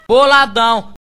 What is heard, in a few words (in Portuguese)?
funk meme